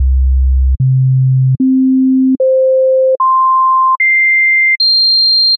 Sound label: Sine, struck instrument simulator